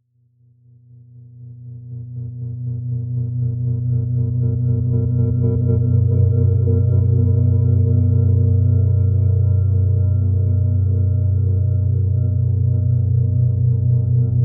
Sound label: Dark and atmospheric low pulsing drone; Deep Space Drone; Science Fiction (Sound Effects:Sound Design)